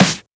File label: Snare; FLPRO